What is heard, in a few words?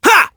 heavy grunt; sonic; vc roger